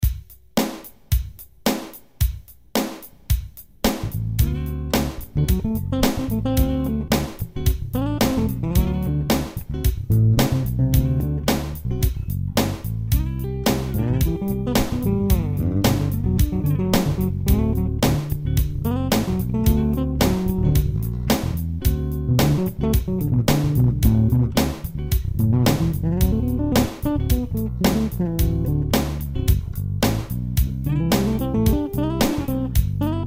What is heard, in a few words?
jam